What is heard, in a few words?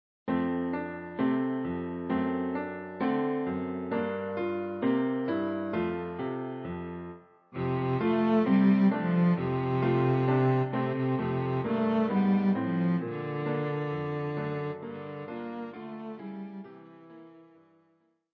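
cello; Jingle Bells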